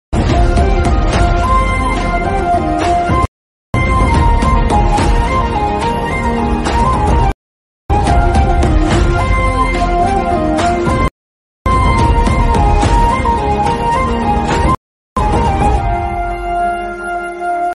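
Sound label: background music; allu sirish; anu emmanuel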